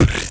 Human Beatbox, Beatbox Hits

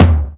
Toms

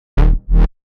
122 B#m Bass, Complex Electro Synths; Prime Loops; Specters

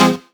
Synth Shot F; Mutekki Media; Vengeance Electroshock Vol.2

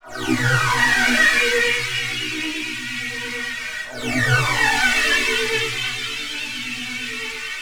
126 MUSIC; funky; Loopmasters; Funky Underground Vol 2

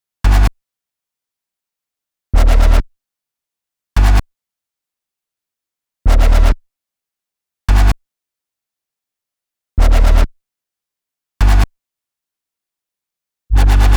129 G Bass, Bpm129, Complex Electro Synths; Prime Loops; Androids

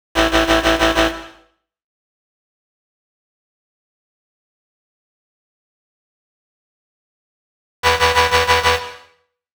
125 Am Bass, electro synths; Prime Loops; Complex Electro Synths; Ratio

